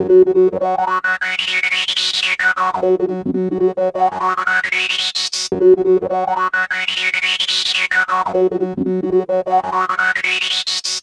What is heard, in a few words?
Key seq; Luxembourg Zwee